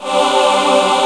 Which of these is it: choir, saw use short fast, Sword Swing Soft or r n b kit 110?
choir